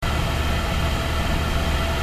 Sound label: fan